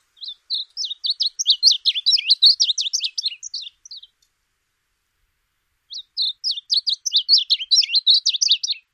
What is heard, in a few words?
song